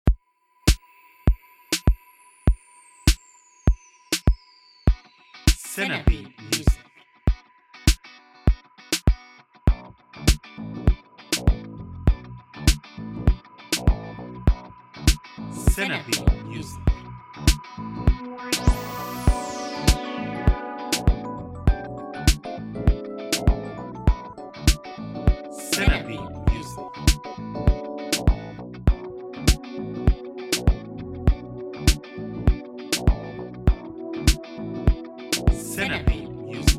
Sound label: smooth chill